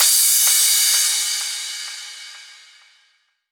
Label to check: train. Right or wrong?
Wrong